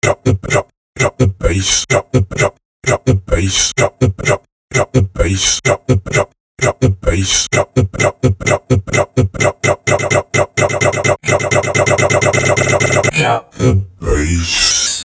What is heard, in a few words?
128 Drop the bass loop; Mass Drop